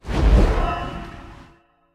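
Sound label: magic enchantment other, weapon enchant; skyrim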